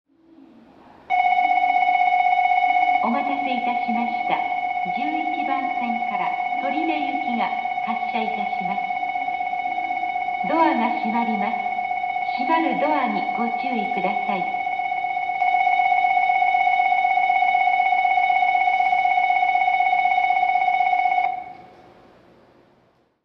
bell, station

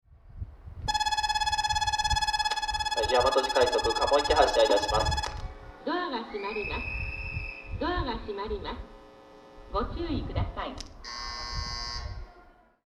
bell, station

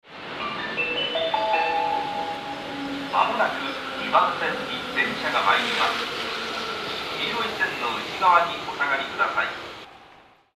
mejiro; 2番線（山手外）接近放送; jreast; yamanote

